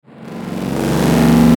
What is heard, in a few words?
Ascend Reverse Swell Buzz; Blastwave